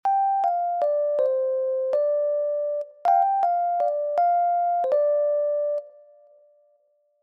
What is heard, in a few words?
melody